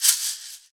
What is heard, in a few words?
shakers